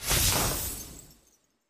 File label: win line flash; fairyhansel